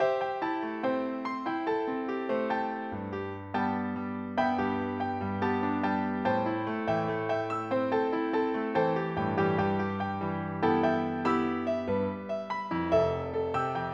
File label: music